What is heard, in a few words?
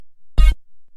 menu sound; gta san andreas